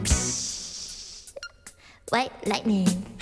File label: psssssh; White Lightning